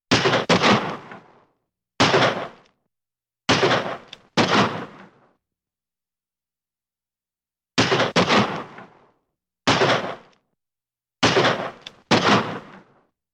Pistol Shots

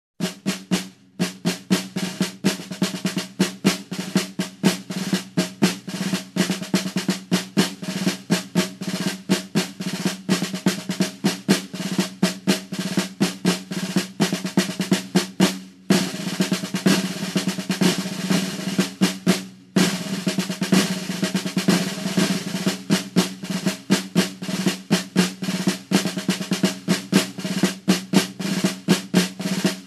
Marching snare rhythm

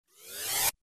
Short Synth Riser

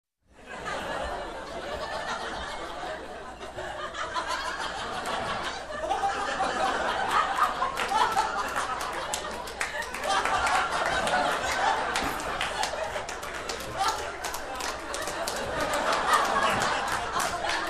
Group of People hilariously laughing

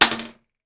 Toilet seat down clattering

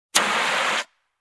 Fire Extinguisher

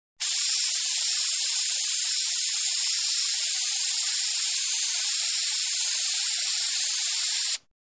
Water Spray

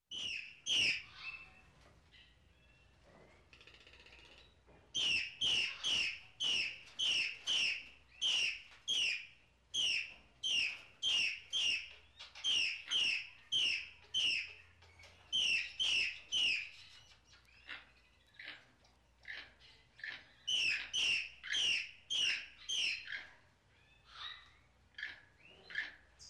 Hatchling crying

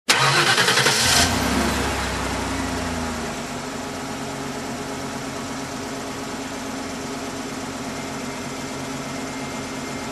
SUV engine ignition and drive away

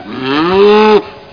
Single cow moo

